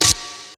normal hit clap

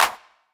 Light Clap; osu

